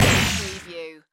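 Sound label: Production Element Hit Sweeper; Sound Effects Bible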